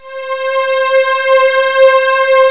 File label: analg strngs hi; Octamed 6; walkabout; synth strings